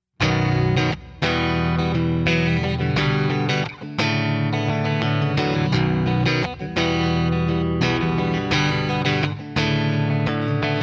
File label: Reverend Descent W Neck Bridge Coilsplit Simulation Strumming